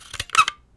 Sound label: Spring Loaded Plastic; bb - Smol Mechanisms